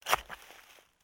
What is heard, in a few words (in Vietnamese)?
dap xeng cam xuong dat cat dao xoi